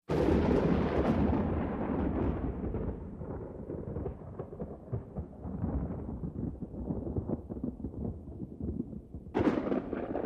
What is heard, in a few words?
Thunder, distant